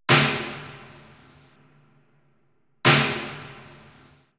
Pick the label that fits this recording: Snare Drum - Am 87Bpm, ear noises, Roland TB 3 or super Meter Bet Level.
Snare Drum - Am 87Bpm